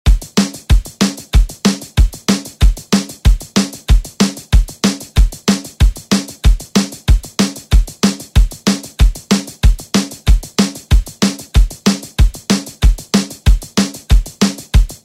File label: Rock Redrum; Deejay Hit